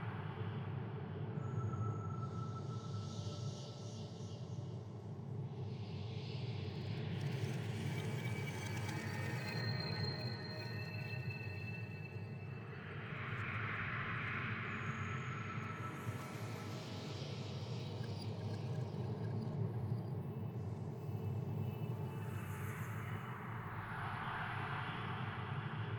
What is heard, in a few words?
ambiance; Hanazuki; android ios mobile gamerip 2018